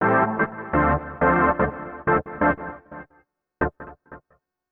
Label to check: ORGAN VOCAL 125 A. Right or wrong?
Right